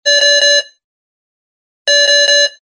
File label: beep low triple signal